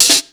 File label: sn d&b; 300 Drum Machines; Yamaha Motif ES-Series